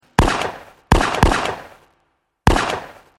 shooting sound

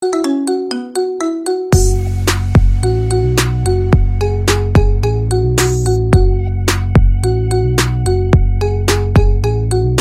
marimba remix

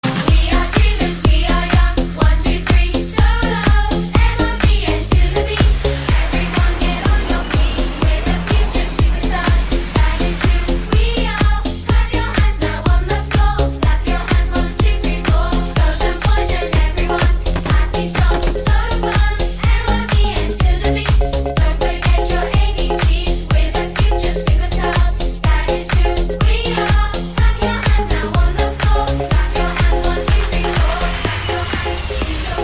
Clap Your Hands

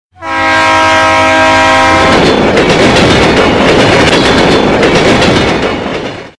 moving train whistle

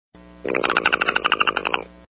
Scary SMS

